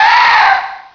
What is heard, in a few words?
PAIN